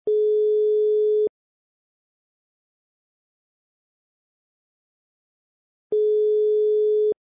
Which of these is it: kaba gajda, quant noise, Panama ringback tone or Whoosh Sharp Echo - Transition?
Panama ringback tone